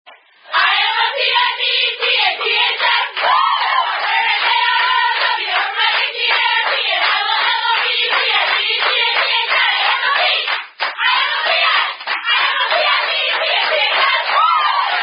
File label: Chant; Nau Pi Beta Phi; I Am A P I (Pi Phi) Chant